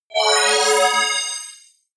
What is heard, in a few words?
appear sparkle positive